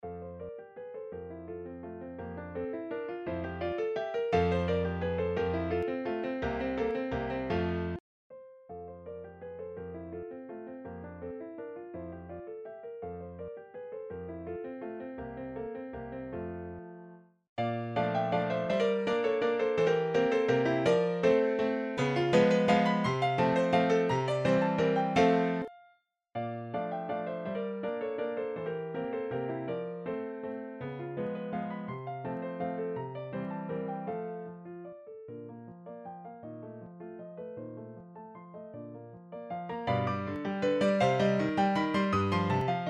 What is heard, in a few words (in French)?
Valse Pour Piano; Ludwig van Beethoven